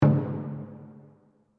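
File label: Drum Taiko forte, Japanese Taiko Drum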